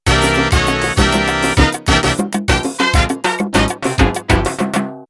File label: Mambo Intro